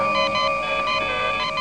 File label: Beep